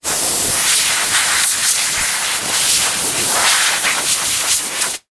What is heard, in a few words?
industrial compressed air aerosol spray burst x1 against object; zapsplat